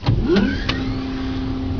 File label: plat, plats; quake1